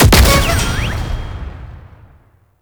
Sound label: Clown Barrel; Deep Archimedea; Warframe; Nineteeen Ninety Nine; Deep Archimedia